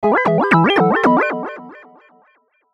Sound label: Logo Warble Chords; Warble Sound Effects; Production Element Imaging Element Accent Transition